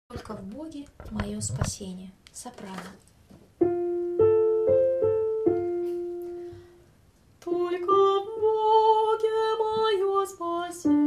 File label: soprano; tolko v Boge